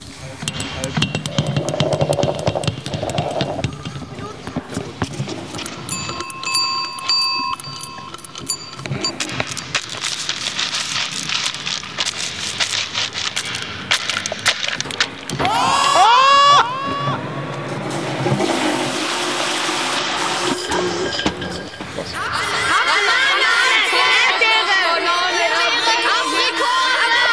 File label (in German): Space Sound